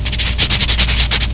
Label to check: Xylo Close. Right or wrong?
Wrong